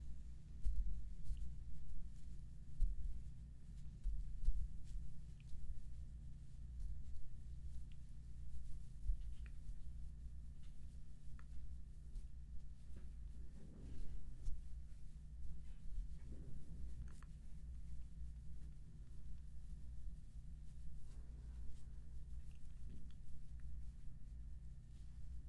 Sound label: Silent room